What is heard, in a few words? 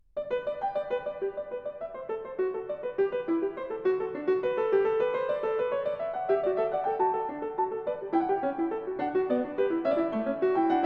Fugue, G major; BWV884; Bach; Well-Tempered Clavier; Book 2